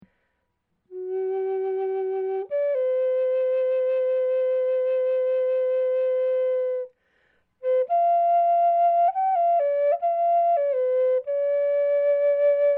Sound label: Drone Medium C, Native American Drone Flutes; Scales; Full Spectrum Scale